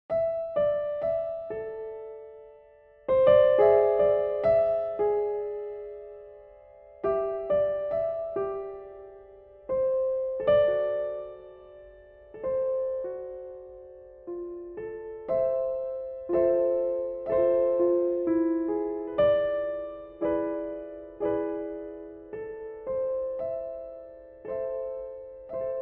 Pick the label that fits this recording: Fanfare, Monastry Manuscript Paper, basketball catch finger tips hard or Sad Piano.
Sad Piano